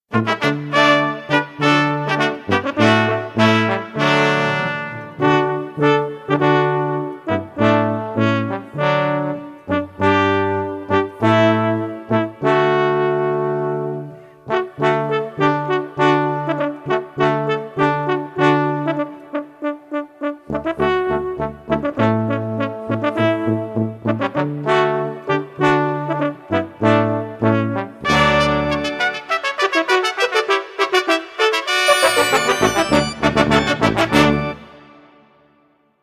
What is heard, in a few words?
joy to the world instrumental